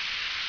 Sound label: Spray; SketchBook 2.1